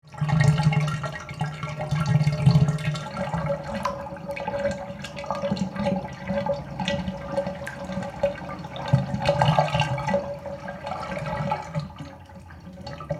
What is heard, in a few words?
Bathtub water draining